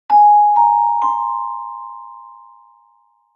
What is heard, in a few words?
Marimba